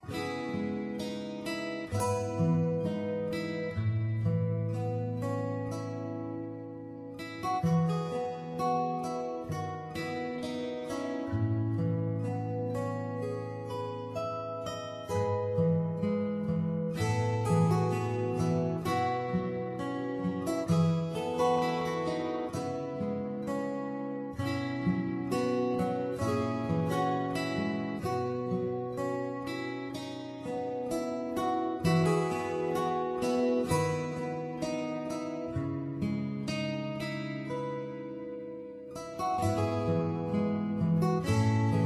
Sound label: Guitar Sad Scene; menherafflesia flowering abyss; android